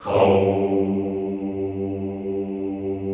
HOUUU, Classical Choir

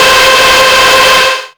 TRASH WIND, Hard & Loud Techno

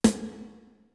TIGHT SNR, DRUMS; Greytsounds VOL-1; T1 DRUMS

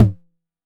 TOMS; Electronic; Wizoo; Platinum 24

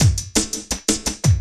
LOOP, Drum & Bass, 170 BPM; Ueberschall; Drum & Bass Resonance

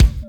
KIT, Dance Mega Drums; Best Service

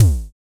DSM2 Tom; MPC DSM-2

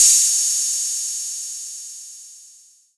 Hat Open Syn, Korg Hihats; Korg 05R-W

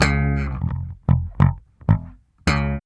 BASS, Funky Ass Loops

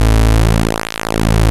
PULSE MOD

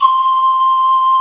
brass, synthesizers; VFX-SD

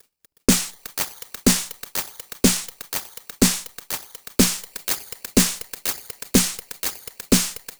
Drum Fx Loop, Electronica

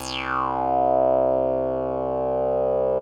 RESO WAH CLAV, bass blips; AMG; Global Trance Mission VOL-1

